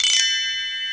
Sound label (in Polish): dzwonek wietrzny